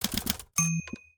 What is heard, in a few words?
Typewriter; Samsung; Galaxy S26 Ultra; notifications; Retro